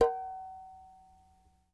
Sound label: Clay Pot harmonic, World Percussion One Shots; Sony CS